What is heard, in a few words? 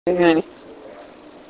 seance reversed